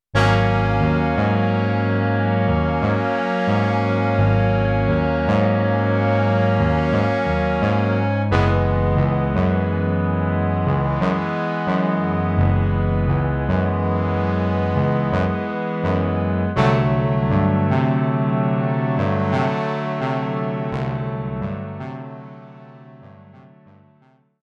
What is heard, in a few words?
Long Chords